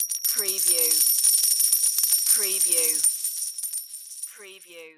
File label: Coins Drop Pirate Gold